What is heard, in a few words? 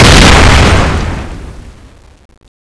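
mine explosion; tfa cso; heaven scorcher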